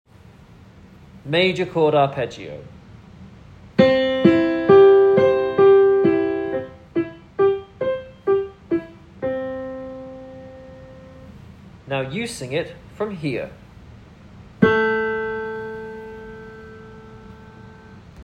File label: Major arpeggio